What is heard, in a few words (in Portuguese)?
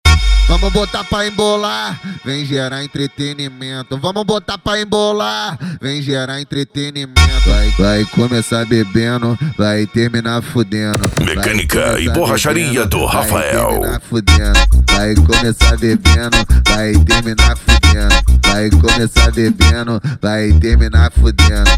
funk ritmado